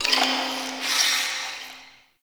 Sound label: Mid Bird; Ambience